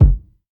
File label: round steel kick drum G key